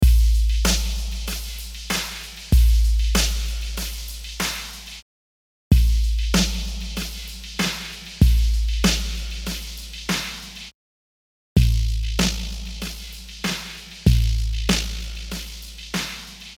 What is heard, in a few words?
Dry Clean Dist EQ